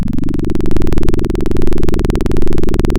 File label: Sound of a plane taking off